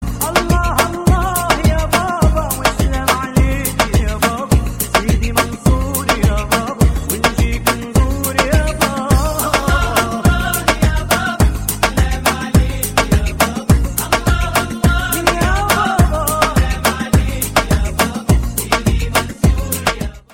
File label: Turkish Rhythm